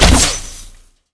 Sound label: ARROW